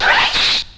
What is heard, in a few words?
Fresssh; sample pour scratch